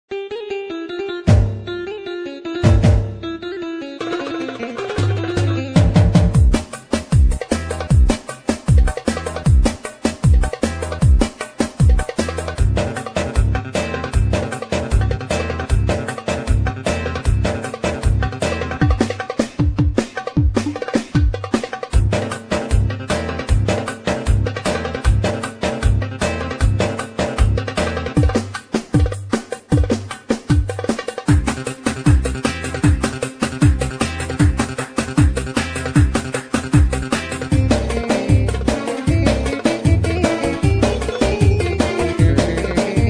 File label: fallahi, turkish